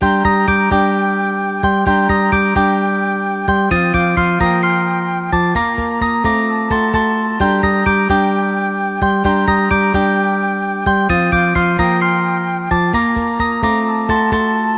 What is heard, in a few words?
ringtone; openmoko